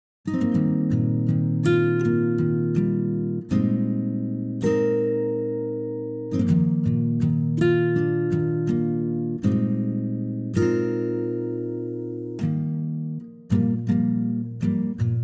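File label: Eb acoustic guitar; key - Eb - vocal range - C to Bb; Stunning solo acoustic guitar arrangement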